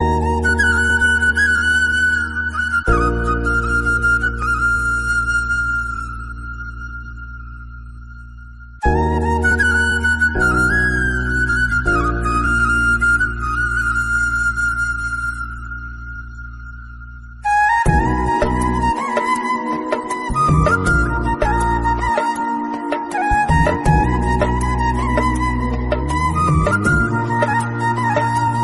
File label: flute instrumental